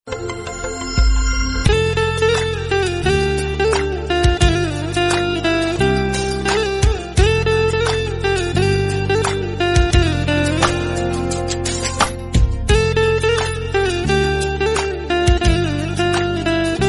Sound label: instrumental